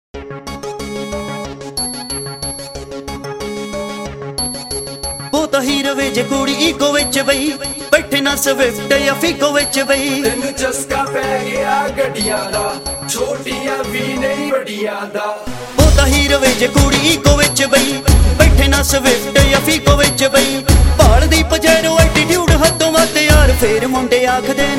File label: Bhangra; Punjabi